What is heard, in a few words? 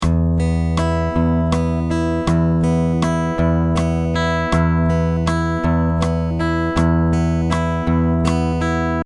fingerpick eighth, guitar